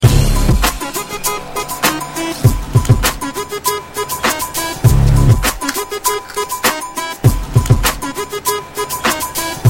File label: Bgm Sms